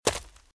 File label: Footsteps - Leaves - Run - Right; RadwinIsland